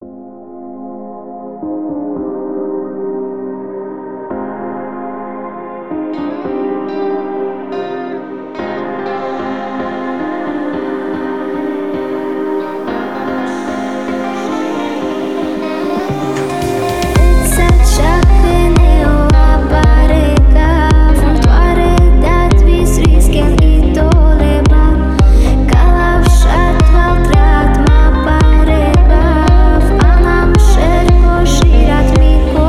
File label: solo guitar